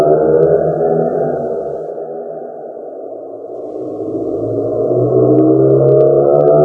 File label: Meow; Doom 3